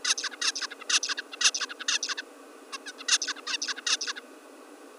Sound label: bird post ttx